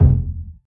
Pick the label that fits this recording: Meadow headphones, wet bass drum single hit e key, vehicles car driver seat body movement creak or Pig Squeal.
wet bass drum single hit e key